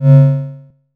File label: force Field; Kenney's Sound Pack; Sci-Fi Sounds